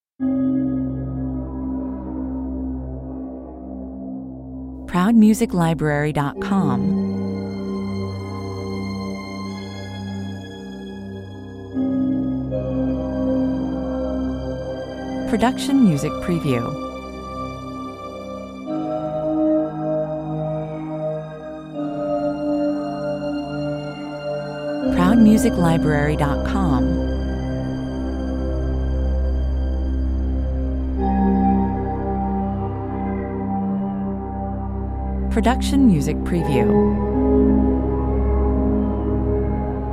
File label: andromeda (no drums) 78 bpm